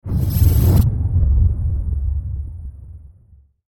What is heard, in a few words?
Laser boss; space inviders